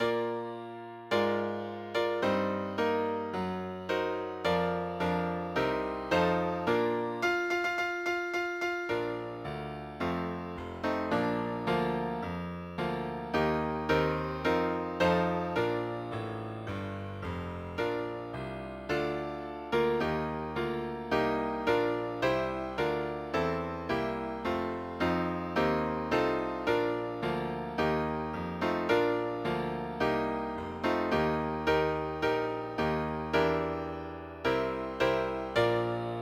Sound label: instrumental music